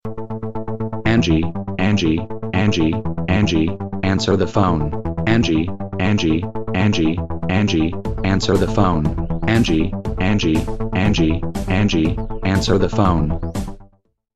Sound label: Rock